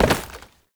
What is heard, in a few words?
Step Wood, Foley; Warframe; Oraxia